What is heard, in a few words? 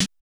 Open Snares